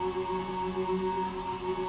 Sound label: reel Spin